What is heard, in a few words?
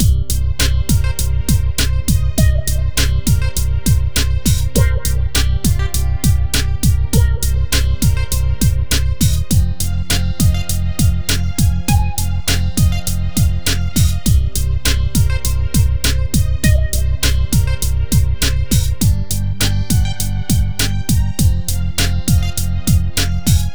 Alien Chickawow Looping, funny quirky